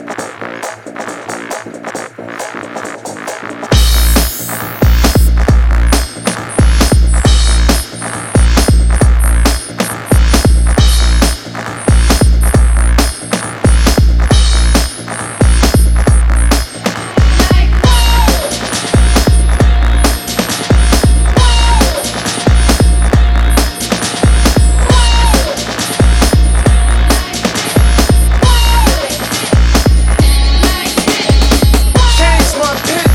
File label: tinnitus